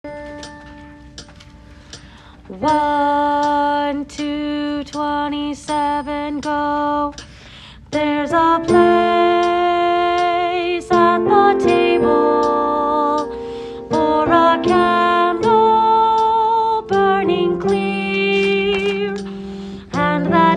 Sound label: candle is a symbol alto